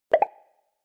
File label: mail alert